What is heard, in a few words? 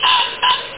cackle; Monster Media Number 15